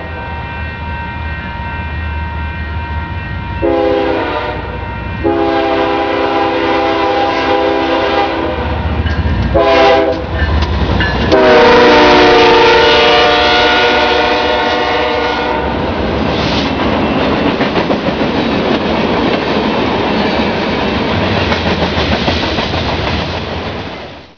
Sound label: freight train; quake2; kitsune